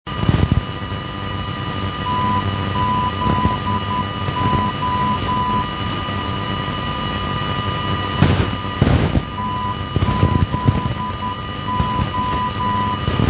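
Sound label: NDB non-directional beacon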